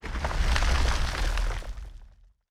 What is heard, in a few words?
Rock Large Debris; Survival Game Sounds - Lite Edition; Environment